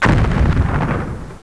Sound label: explod; thexder